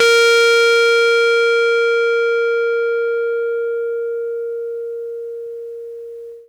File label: RHODES FUZZ; PlatinumPhattCD2; E-MU Producer Series Vol. 8 – Platinum Phatt